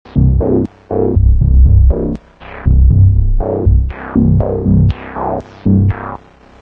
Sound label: computer arp